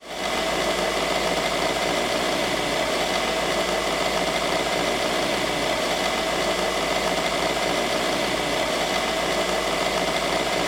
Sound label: Conveyor Belt; Industrial / Machinery